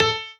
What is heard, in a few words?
piano melo